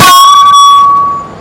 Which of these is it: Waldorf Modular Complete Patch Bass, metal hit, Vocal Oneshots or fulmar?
metal hit